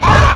pain; svencoop; tor

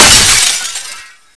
bust glass, debris

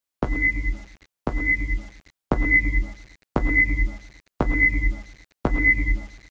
Aliens Motion tracker; svencoop

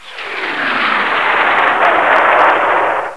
mortar; svencoop

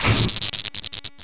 displacer start, weapons; svencoop

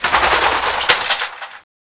animal runs, jungle; gearbox

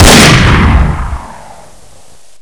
357 shot, weapons

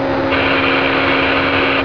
door move; gearbox

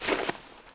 pl snow light, player; cstrike

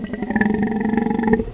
idle, voltigore; svencoop

